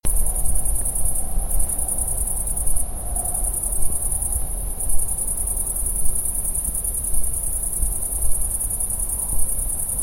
Grande Sauterelle verte

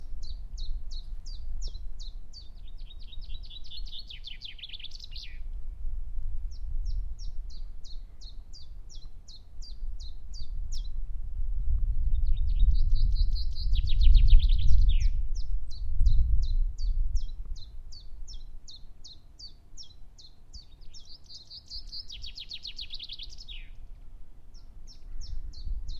Pouillot Pinson

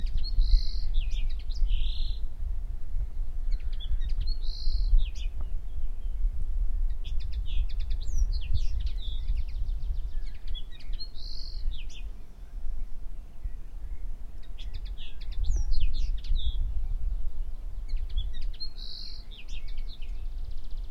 Linotte melodieuse

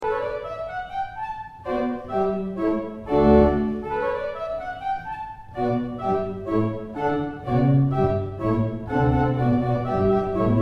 Concerto 2 flutes orchestre; Stamitz

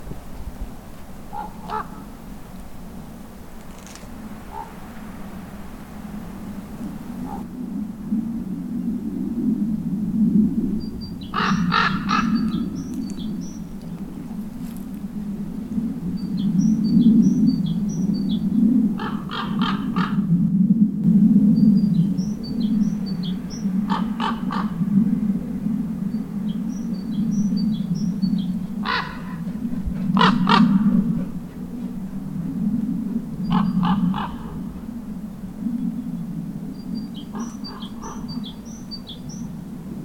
Grand Corbeau

